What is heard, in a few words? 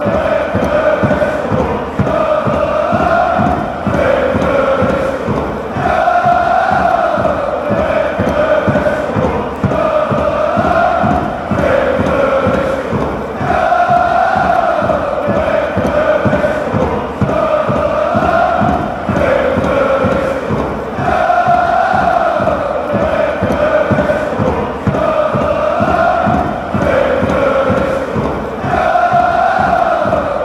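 cup ours, football chants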